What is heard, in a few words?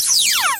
enemy spawn; Tower Defense; freepixel